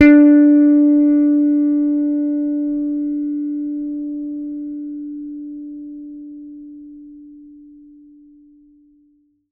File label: T-BIRD BASS PLUCK D4; Bass Mace; DirectWave